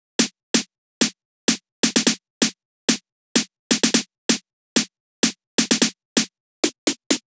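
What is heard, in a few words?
Drum Loop 128BPM snare Tight roll; VEC5 Drumloops; VEC5 Multi Loops 128BPM; Loop Elements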